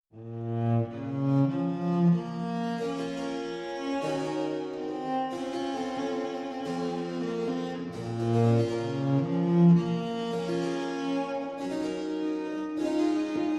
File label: pipa